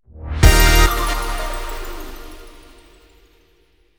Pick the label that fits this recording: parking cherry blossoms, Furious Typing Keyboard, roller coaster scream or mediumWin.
mediumWin